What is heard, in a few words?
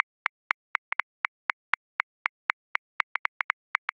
Rolling Hip Hop; Grooves; Drum Booth; Ableton